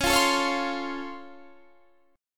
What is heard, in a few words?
Db Augmented Major 7th, down, Guitar, Standard; strummed; JGuitar